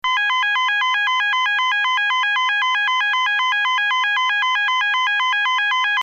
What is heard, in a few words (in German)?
ton, Signalgeraete